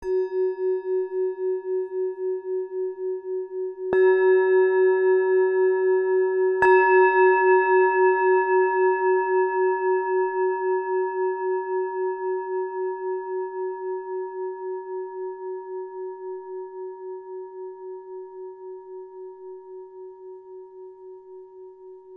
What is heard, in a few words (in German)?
klangschale titan